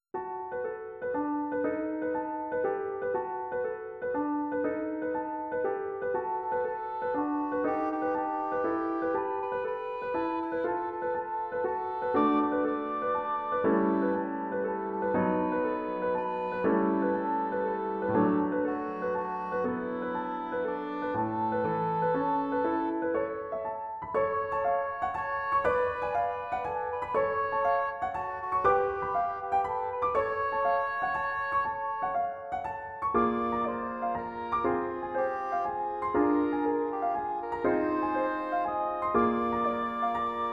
Les clochettes des muguets D accompaniment

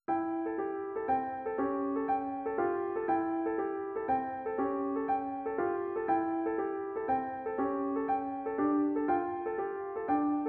Les clochettes des muguets C accompaniment

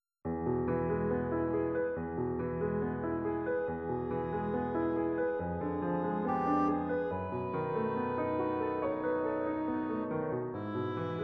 Si mes vers avaient des ailes E accompaniment

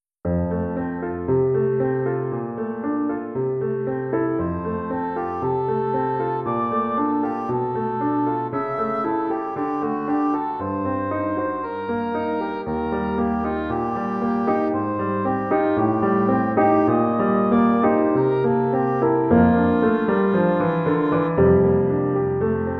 Chanson d'amour

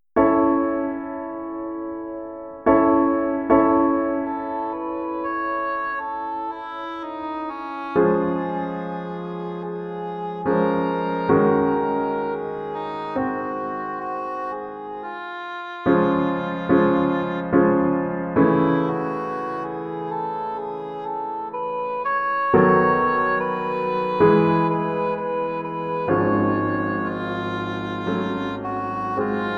Chanson Triste

